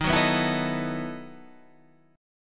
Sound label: B 11th Eb, chord